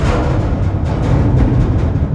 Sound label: garage move, doors